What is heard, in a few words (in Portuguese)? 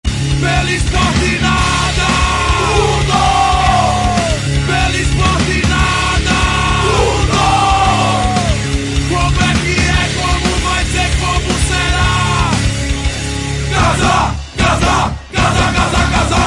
toque do tema